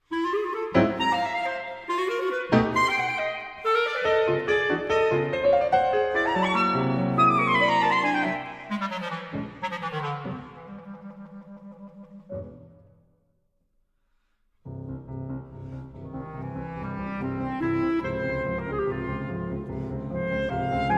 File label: sonate; poulenc